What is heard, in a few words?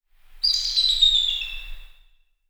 eagle